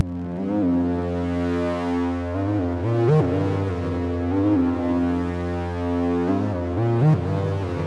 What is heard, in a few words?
122 BPM Melodies Lead D maj C min Tonic F; mmb studio soundtrack essentials